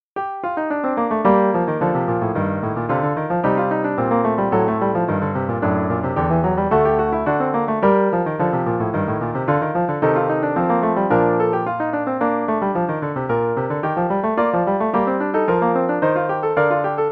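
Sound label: grand piano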